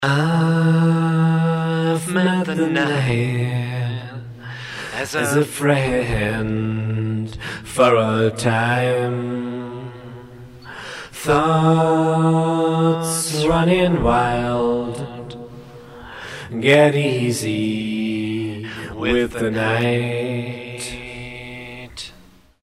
gesang refrain

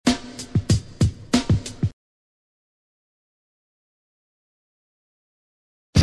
filmmusik

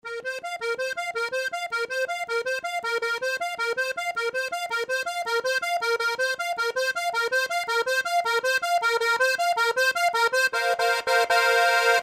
80 bpm ziehharmonika

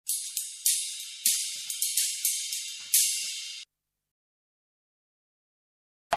filmmusik

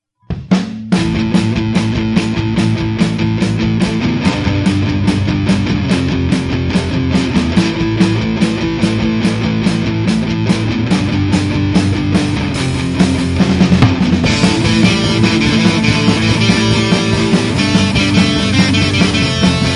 nacht

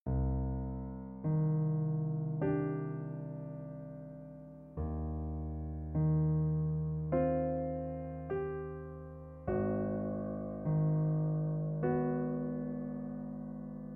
irgendwas mit viola

